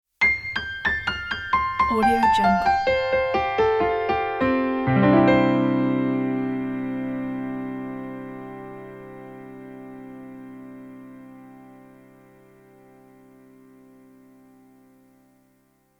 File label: film piano logo